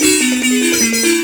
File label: BZ XY GRV, BUZZ XYLO; Spectrasonics; Heart of Africa 2